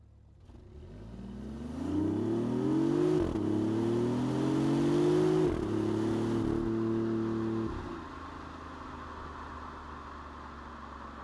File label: BMW i8 Sport mode 0-100kmh outside the car